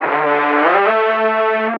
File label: vocal bias Gm; Slate; AURORA Sample Pack; Vocals